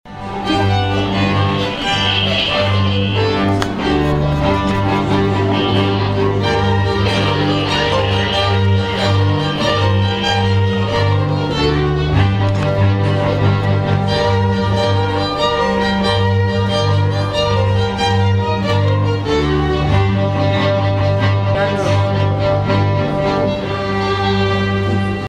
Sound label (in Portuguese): notas musicais